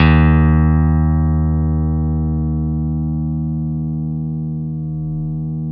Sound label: CP-70 D#1; E-MU Formula 4000 Series Vol. 3; Analog Odyssey; VK Instruments